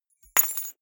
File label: key ring small drop parquet hard